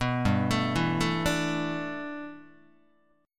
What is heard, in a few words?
G 6th Add 9th G Guitar, chord; G6add9 arpeggiated